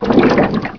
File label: swim, player; hl2